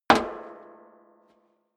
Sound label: step high heels metal rattle single left; schritte